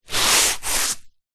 footstep single scuff scrape barefoot on foam exercise mat; zapsplat; foley